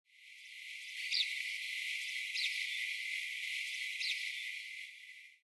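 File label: eyebrowed thrush; mamitahi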